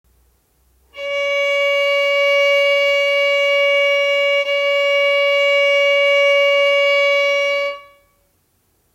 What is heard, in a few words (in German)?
Vibrato Ton Cis ohne Vibrato